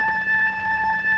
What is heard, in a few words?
OPTI B3 A3, OPTIGAN; Sonic Reality; Vintage Timetraveler